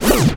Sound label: quick stop; disco danny